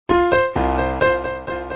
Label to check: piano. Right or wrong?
Right